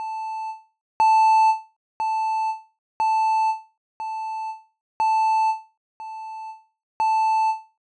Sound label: loudness